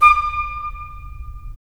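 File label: FL, flute; LA Composer vol. 3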